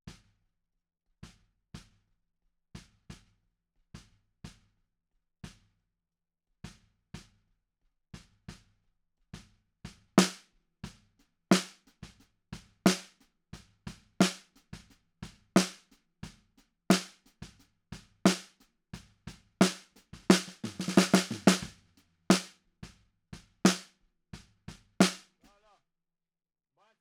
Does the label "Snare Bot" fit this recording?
Yes